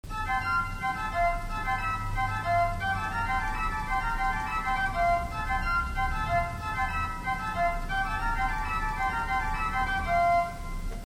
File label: Organ Great Fourniture III